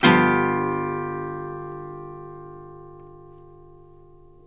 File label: Blues Guitar, chords